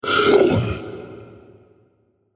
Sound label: Beast Monster; horror; Andrew Fleming